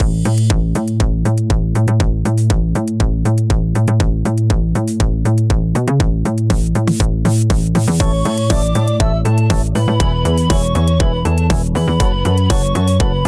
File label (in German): Hintergrundmusik; Background Musik